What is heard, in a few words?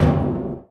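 panels impact small, metal; Portal 2; Source Engine; video games; physics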